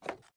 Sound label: fs creakwood, footsteps